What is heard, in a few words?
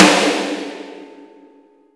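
SN, snare; Best Service; Real Mega Drums VOL-1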